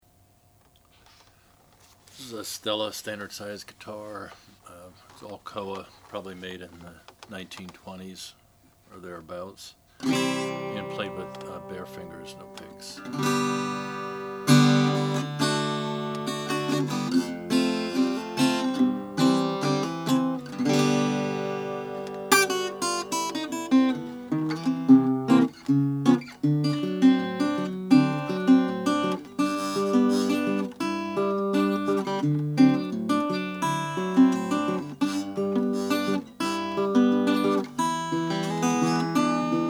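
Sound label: oscar schmidt stella koa, Guitars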